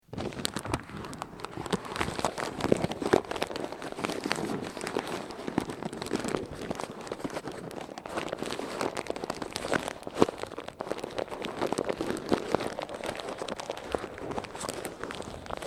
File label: paper